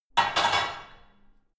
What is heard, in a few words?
barbell only replace hard